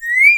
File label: bird calls, percussion